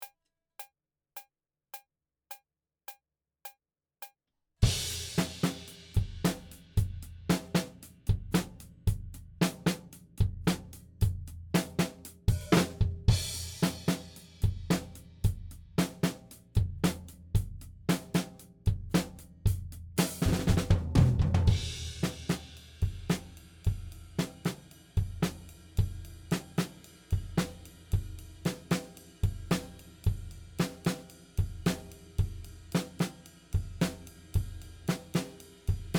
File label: Drums ONLY; Pre Pro